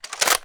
draw, weapons; phantom

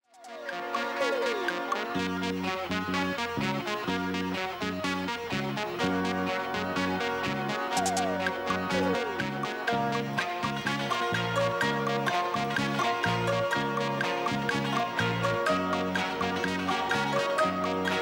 bomb planted; music kits custom

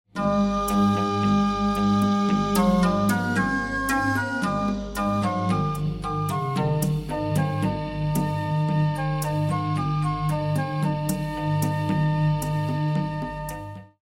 lost round; music kits custom

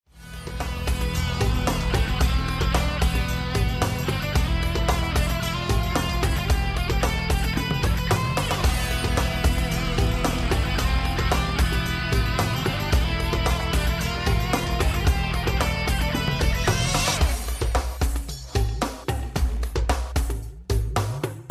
bomb planted; music kits custom

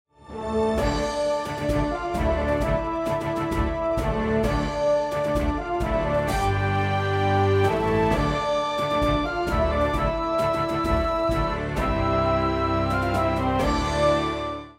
start action; music kits custom

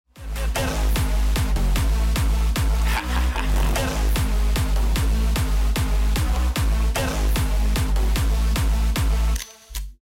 round ten sec count; music kits custom

